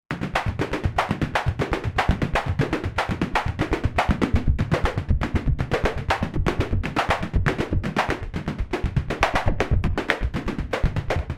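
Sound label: Drum Kick Snare, synth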